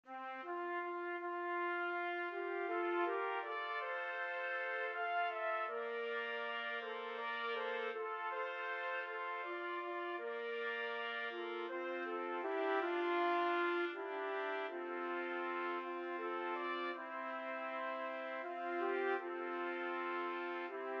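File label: 4 trumpets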